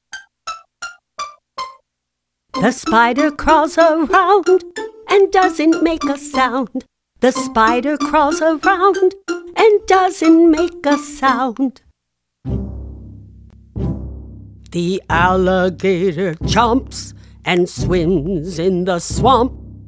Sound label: Spider Crawl Alligator Chomp